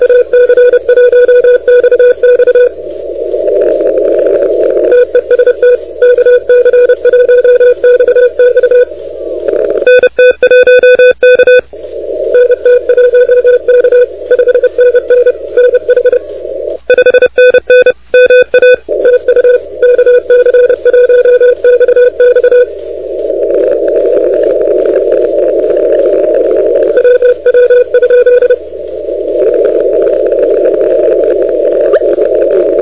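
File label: continuous wave 28MHz; ARRL10M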